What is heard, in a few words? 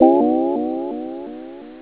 Boop